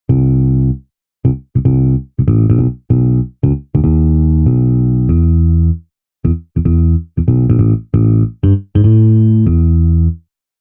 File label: Bass